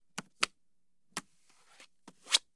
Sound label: Card Flips Grabs Card